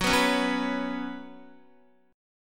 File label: Gb Suspended 4th Gb, down, Guitar; strummed; JGuitar